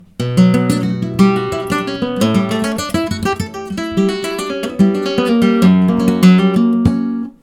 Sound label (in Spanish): falseta